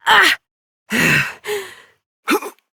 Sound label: Death, Adult; New War; Operator